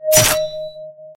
attack ping

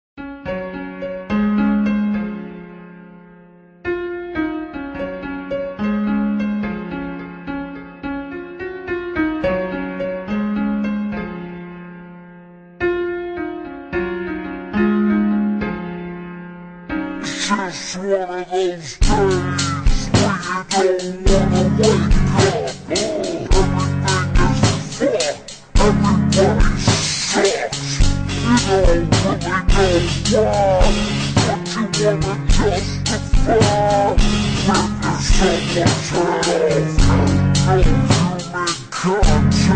piano car

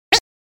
quack

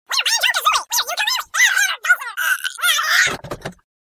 platforming

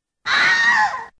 wilhelm

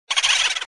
boo

